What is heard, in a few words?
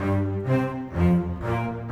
Bass Cello, Orchestral; Sonic Foundry (Sony Creative Software) - Orchestral VOL-2